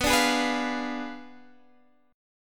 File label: F Diminished 7th B